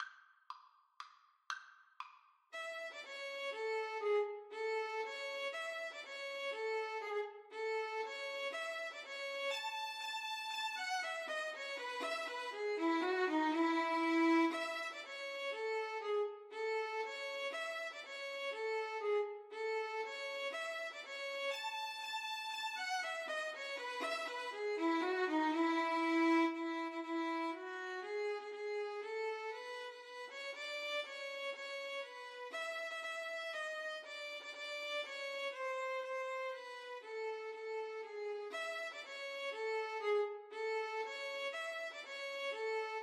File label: minuet, Violin Duet